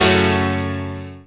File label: Piano Bass; Snake